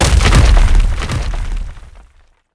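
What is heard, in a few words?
steamer gate death, monster; steamer crazy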